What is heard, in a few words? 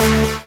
Synth Shot D